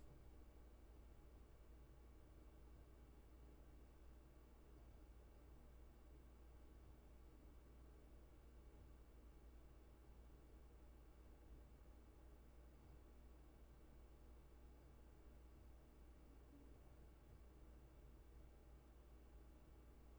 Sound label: room tone